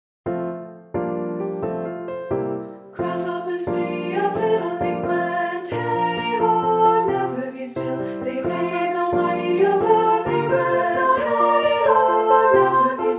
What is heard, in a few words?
Piano Unison; a collection of rounds for emerging choirs